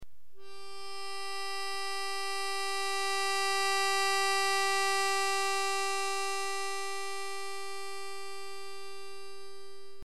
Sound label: G Natural